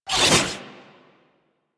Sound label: Warfan Whoosh; Warframe; Tenno; Broken Frame